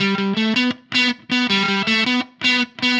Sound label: Happy Pub Guitar Riff, Indie Rock; Sonic Foundry (Sony Creative Software); Indie Rock Anthems; Session 05 - Happy Pub